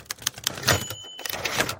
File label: event pop up; hoi4